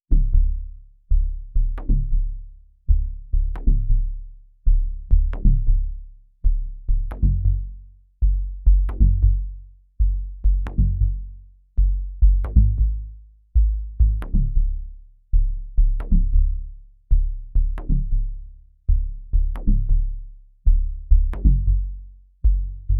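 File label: Bass Loop C minor 135 BPM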